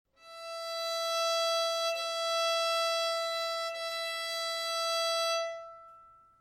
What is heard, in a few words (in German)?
stimmton e, geige